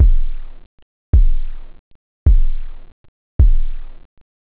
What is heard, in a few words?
wood, drums technobd